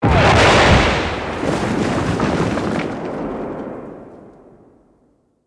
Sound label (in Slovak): Vybuch; Revelation Sight and Sound SK